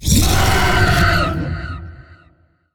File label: Vocal Accent; Dialog; Warframe; Zariman; Void Angel